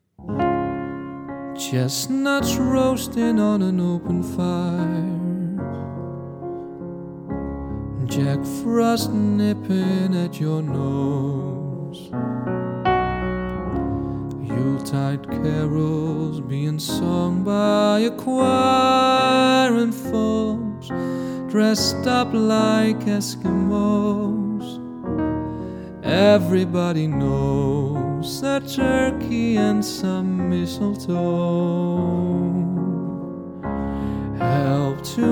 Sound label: Christmas Song